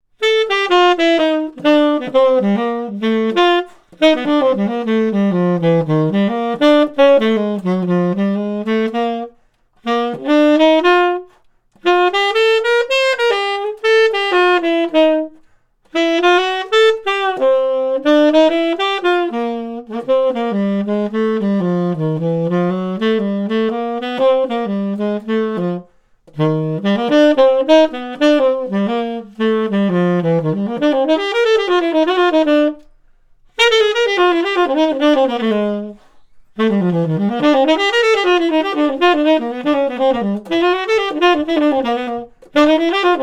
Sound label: Bebop Blues